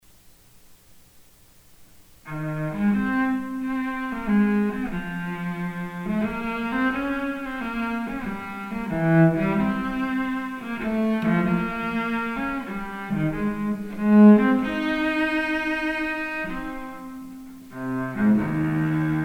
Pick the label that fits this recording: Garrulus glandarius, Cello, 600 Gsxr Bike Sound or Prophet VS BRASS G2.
Cello